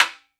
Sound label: CONGA SIDE; World Combo